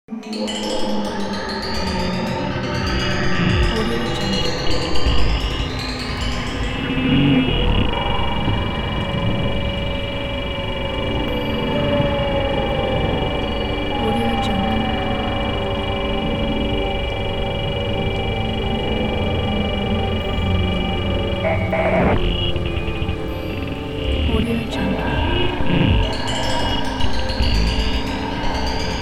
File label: horror soundscape suspense atmosphere; kipaloops